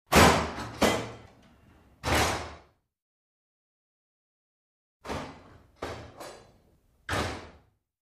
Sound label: Emergency Exit Door, push bar